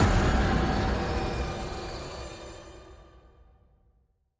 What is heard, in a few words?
Horror Impact; SoundsCrate